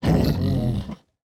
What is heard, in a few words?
evil nutria, monsters; GGJ22